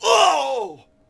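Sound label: Longbowman Die Vox; Civ4 warlords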